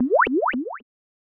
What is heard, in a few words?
Magic cast; Syrup 2 Candy Alchemy RPG